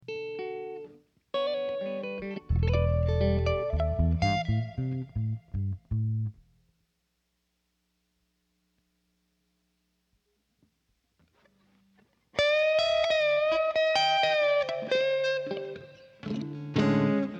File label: Strings